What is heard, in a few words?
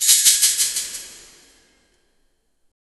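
reverb metallic pipeline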